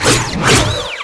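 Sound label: brain attack; lmctf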